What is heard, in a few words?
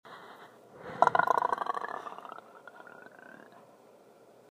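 Gurgling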